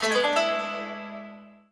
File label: new mail